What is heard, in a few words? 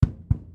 Knock Light